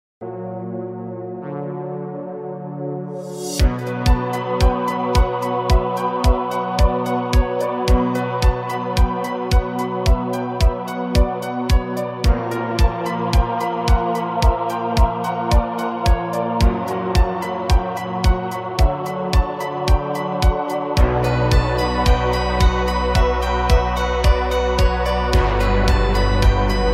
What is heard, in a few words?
spooky nocturnal ringtone